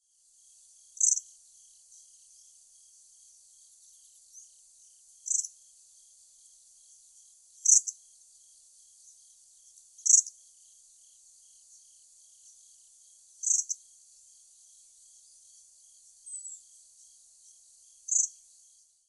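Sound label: Grey-streaked Flycatcher; hyogo; koe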